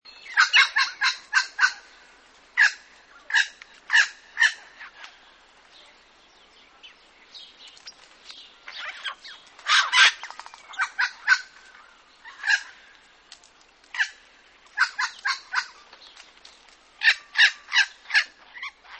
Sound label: Red wattle bird; Bird Calls